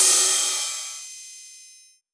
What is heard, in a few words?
Rides, Cymbals